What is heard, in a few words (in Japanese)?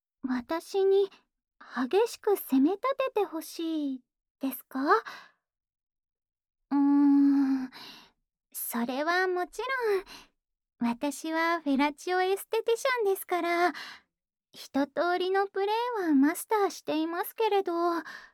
速～いピストンフェラチオ